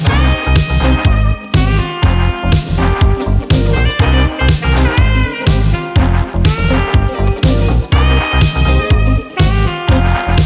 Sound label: DANCE INSTRUMENTAL MIX